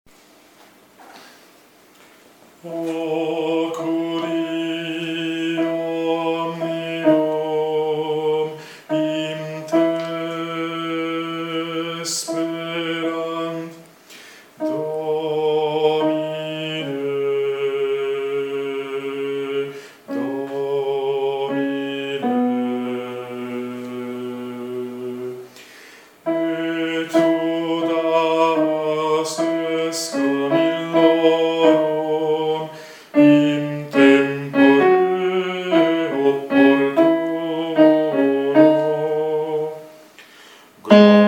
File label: Oculi omnium Contralti; repertorio polifonico